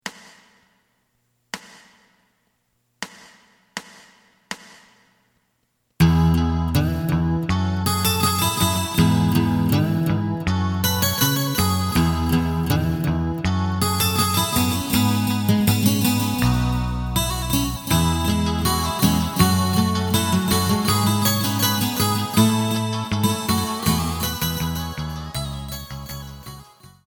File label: drum; エレキバンド用カラオケCD